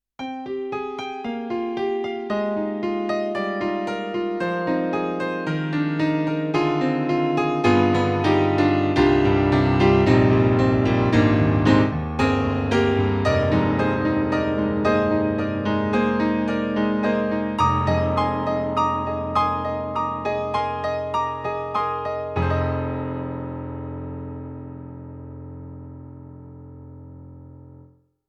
YAMAHA S80

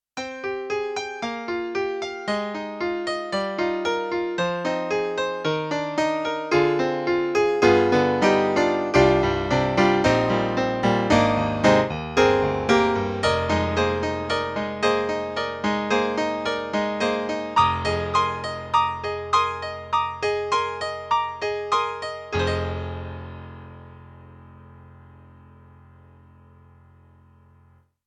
E-MU PROFORMANCE1+, Rock Piano

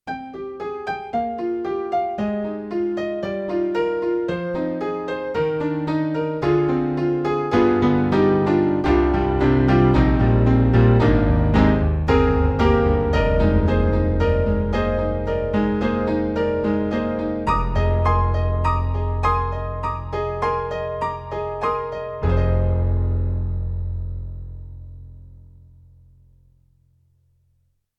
Acoustic Piano Dark Grand; Alesis NanoPiano

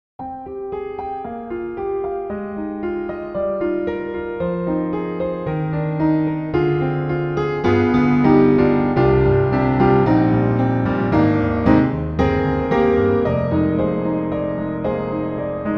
CFX Concert Grand, Soft; GARRITAN

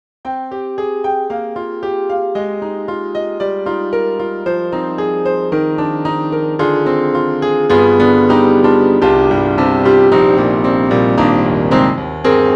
YAMAHA MU2000

